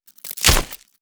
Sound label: Attack Pincher; Mars Sortie